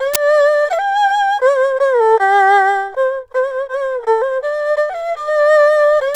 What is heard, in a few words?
ERHU 90 C; INIS - Opium